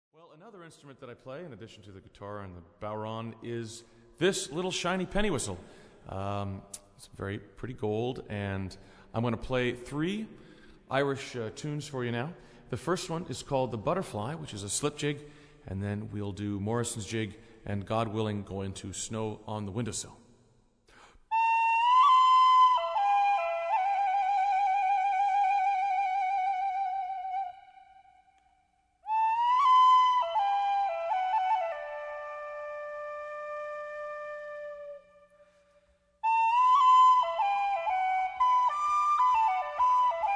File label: Whistle Tunes; The Celtic Concert